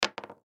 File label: Dice Two Drop Hit, Dice Two Drop Hit Settle Felt Table; Casinos; SoundEffects-Blastwave; Sports